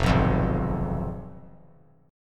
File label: F Diminished; strummed; 3C,3F,3A,3D; 5,6,8,6; JGuitar